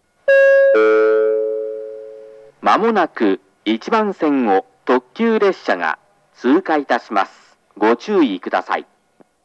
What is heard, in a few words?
pass limited express; jr-east; hirooka